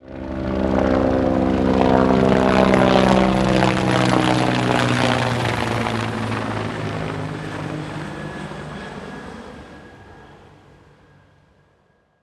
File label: helicopter fly over; transportation